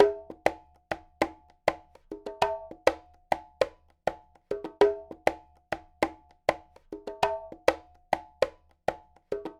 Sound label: Conga, Latin Percussion